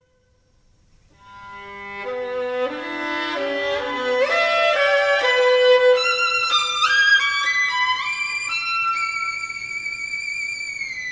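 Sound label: Solo, The Orchestral Bassoon; Scheherazade; Berlin Phil; Karajan; scheherazade mvt 2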